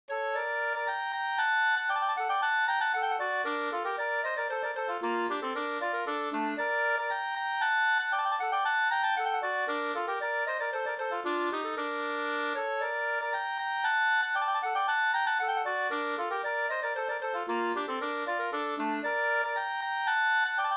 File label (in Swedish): polska storpolska